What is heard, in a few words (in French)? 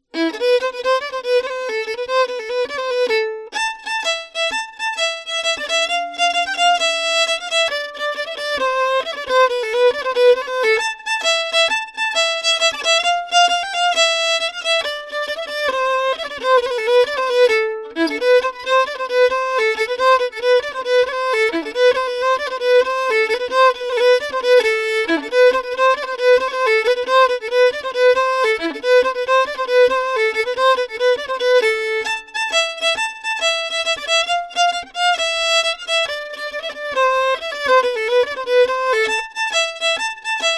tarantella napolitana violon